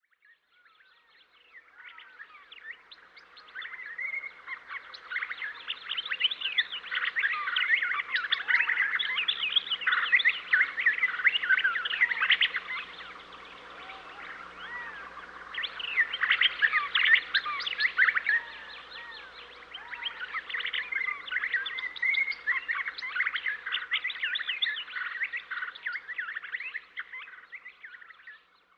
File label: woodland; sound oasis